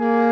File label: login failed; Spotify mac app 2007